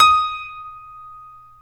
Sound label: StereoGrand, Keyboards